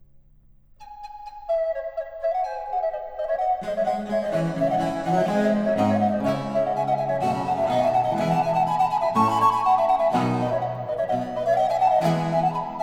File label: Sonata Terza in D Minor for two Recorders Allegro